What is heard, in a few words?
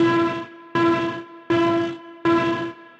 Start Game